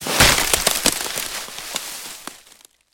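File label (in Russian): шуршание пергаментом в древней библиотеке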